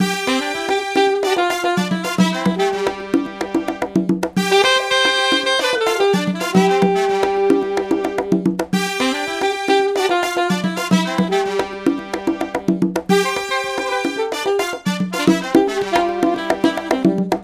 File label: Cuban Song